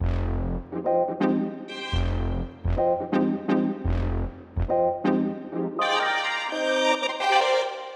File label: F 125 Dry, melo filter loop; Espionage; RoBKTA Sample Pack Supreme